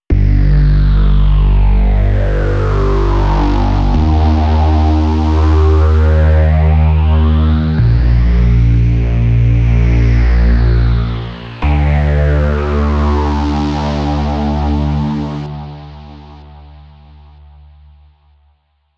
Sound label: Bass 808s Psychedelic After